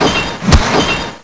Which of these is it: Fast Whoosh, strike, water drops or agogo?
strike